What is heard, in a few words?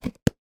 sport tennis ball tube lid put on; sound effects one